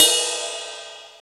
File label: POWER RIDE, MPC-60 DRUMS; Greytsounds VOL-1